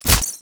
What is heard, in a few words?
trig, raygun; weapons; nzp